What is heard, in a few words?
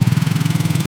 firing the kick to, motorcycles